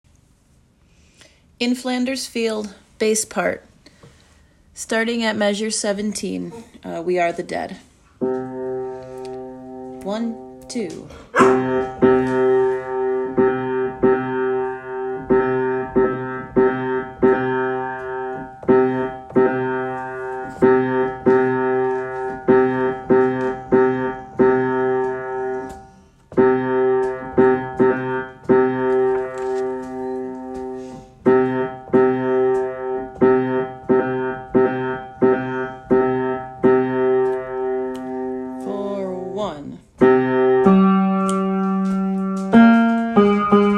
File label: bass